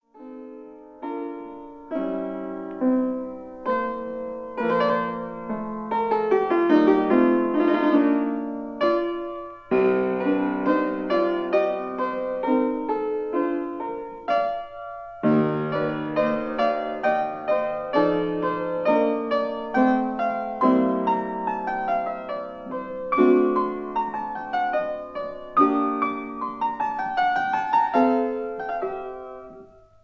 Piano Sonata No 15 K533 Andante, Mozart Piano Sonata No 15 K533 mvt 2